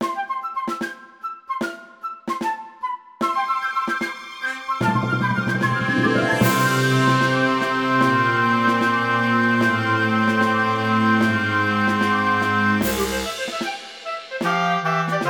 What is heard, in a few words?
Orchestrated; Kid Icarus